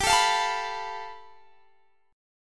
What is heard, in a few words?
A 7th Suspended 2nd Sharp 5th